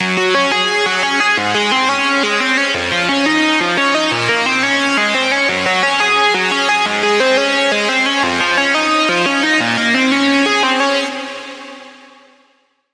Lead, Serum 2; Lead - Ocean Heart; Routed Sound; Serum 2 Expansion - Flame Summoner Vol.1

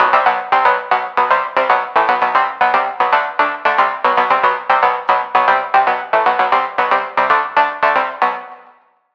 Pluck; Red Flare; Routed Sound; Vital Expansion - Noble Gangster Vol.1

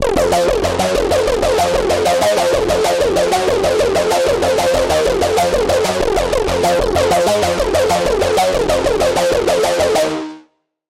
Lead; Baphomet; Routed Sound; Spire Expansion; Doom Carpenter Vol.1

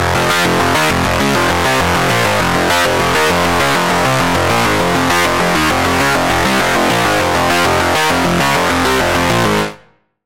Lead, Sylenth1

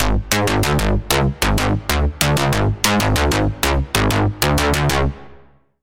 Bass, Sylenth1; Routed Sound; Sylenth1 Expansion - Doom Carpenter Vol.1

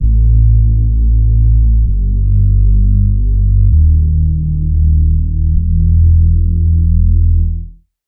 Reese; Death Stare; Routed Sound; Spire Expansion; Noble Gangster Vol.1

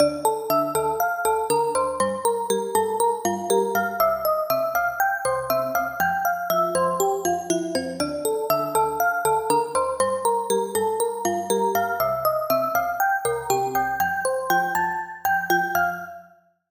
Bell - Crystal Glass; Routed Sound; Serum 2 Expansion; Story Walker Vol.1

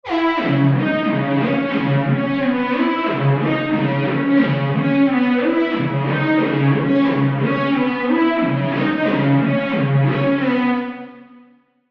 Lead - Shadow Shredder; Routed Sound; Vital Expansion - Street Vanquisher Vol.1

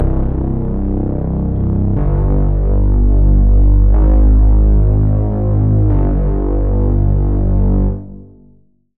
Reese; Routed Sound; Serum 2 Expansion - Noble Gangster Vol.1

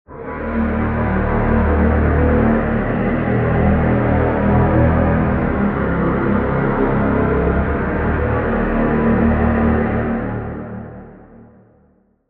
Pad; Nihility Field; Routed Sound; Vital Expansion - Chaos Bringer Vol.1

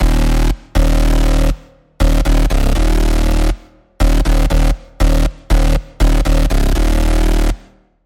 Bass; Routed Sound; Spire Expansion - Chaos Bringer Vol.1

